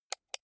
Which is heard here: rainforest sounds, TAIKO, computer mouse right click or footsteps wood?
computer mouse right click